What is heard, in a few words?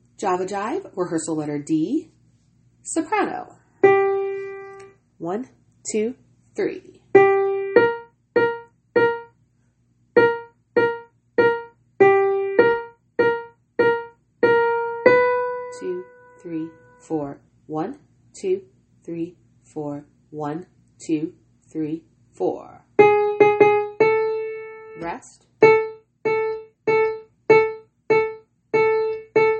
soprano